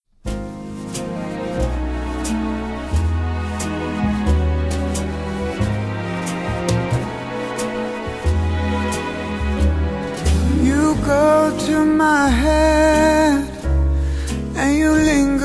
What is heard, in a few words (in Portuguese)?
Aniversário Amizade Neutra Masculino; Aniversário Amizade Neutra (Nós Desejamos) Masculino